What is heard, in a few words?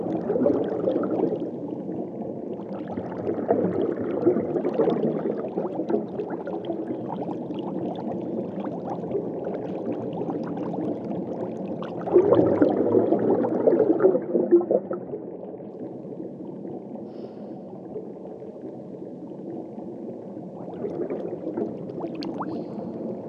Underwater Sounds Soundscape